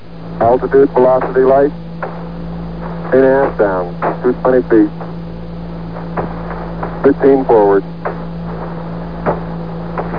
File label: landing